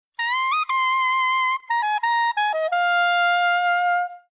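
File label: soprano sax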